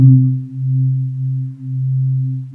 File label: sweepor C2